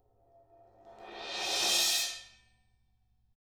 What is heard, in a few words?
ZILDA SWELL